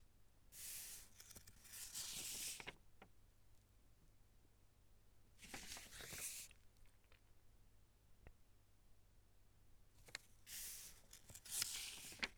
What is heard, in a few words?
noisy File; noise of the town/city